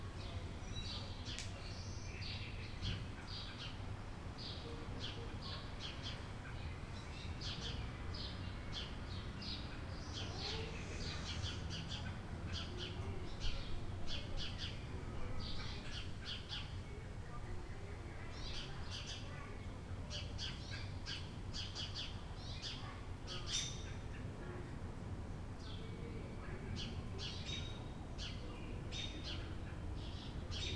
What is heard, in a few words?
orange birds jet toronto